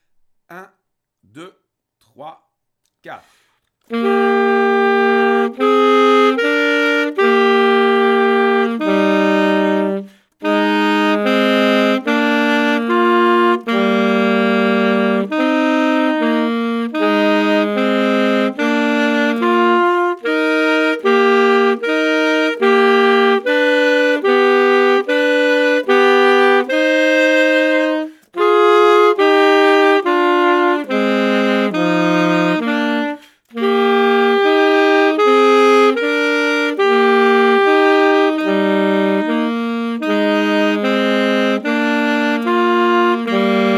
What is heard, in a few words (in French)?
duo des nomes 2 saxs